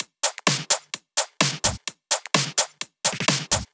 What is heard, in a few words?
Drumloops 128BPM